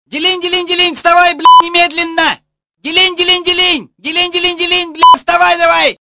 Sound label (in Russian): Budilnik